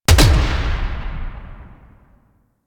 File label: Sniper Punch; DE; Warframe; Frumentarius; Exalted Sniper